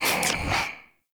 Rifle Alt Mech; Weapons; Warframe; Zariman

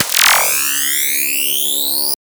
Burst Laser Charge Up; Warframe; Teralyst; Ropalolyst; Abilities

